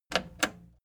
plastic button slack click slow

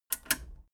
metal button radio click power off fast

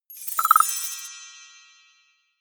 percussion

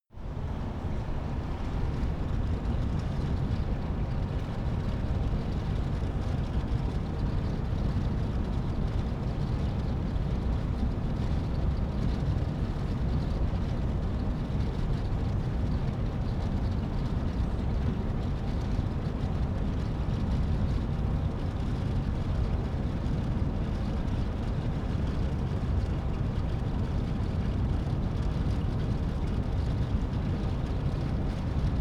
office ventilator

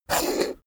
poker chip flick slide table long

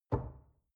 drawer large trash close door

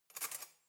cutlery knife drawer grab soft

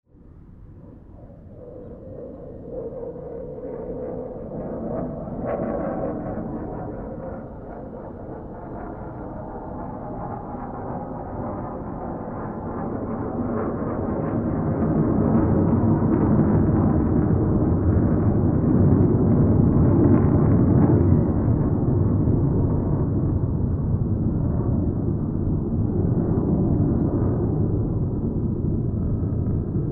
jet passing

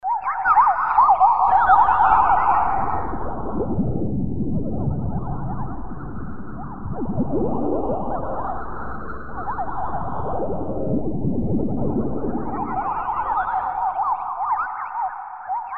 e birds boubbles

